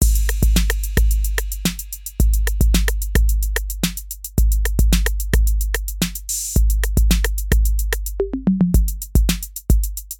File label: tape 808